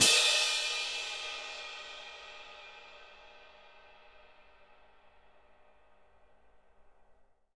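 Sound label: cymbal, Orchestral; ILIO; Synclavier Percussion 2; World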